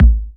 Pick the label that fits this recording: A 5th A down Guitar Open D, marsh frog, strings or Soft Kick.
Soft Kick